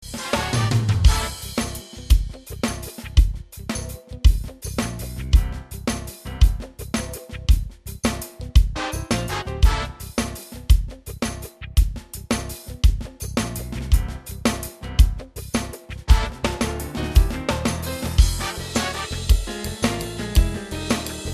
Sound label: shuffle